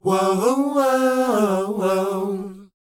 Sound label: WHOA; ILIO; Vocal Planet